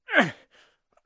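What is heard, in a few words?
moan, male; Interactions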